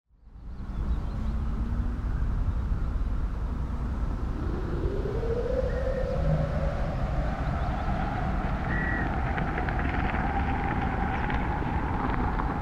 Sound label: glider haulage cable